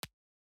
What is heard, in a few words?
Club Ambience; Experimental; Ableton; Convolution Reverb